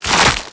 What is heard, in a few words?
foley, zombie revived; Half Life Alyx